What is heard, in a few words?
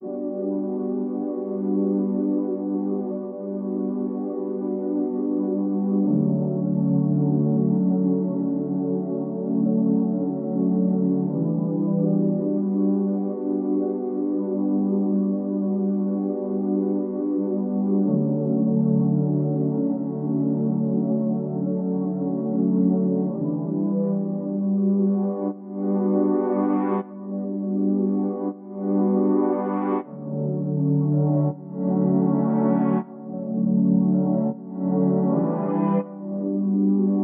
80 BPM D Maj Pad; RnB; Loop Stems; Cymatics; To You; 2022 Melody Collection; RnB Pt 2